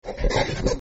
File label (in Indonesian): orang utan